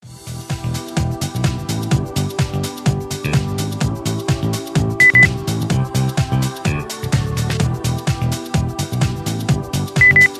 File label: percussion transrapid beep